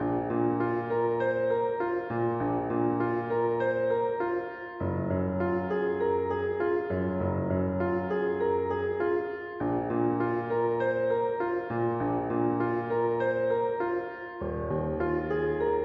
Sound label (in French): ambiance; picklejar